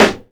tight low end acoustic snare sound g key, snare single hit; Duration 0:01; Channels Mono